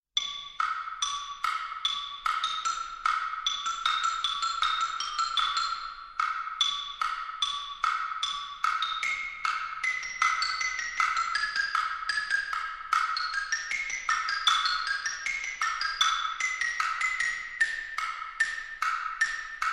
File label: Harp concerto